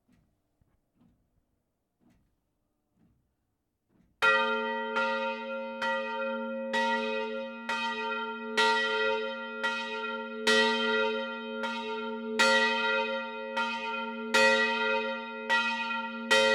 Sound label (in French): cloche; campanologie; madeleine